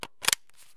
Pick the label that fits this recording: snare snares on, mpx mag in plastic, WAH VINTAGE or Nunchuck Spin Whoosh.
mpx mag in plastic